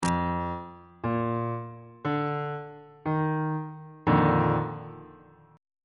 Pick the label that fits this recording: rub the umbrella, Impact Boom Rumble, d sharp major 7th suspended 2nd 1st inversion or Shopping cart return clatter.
d sharp major 7th suspended 2nd 1st inversion